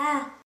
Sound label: ah sound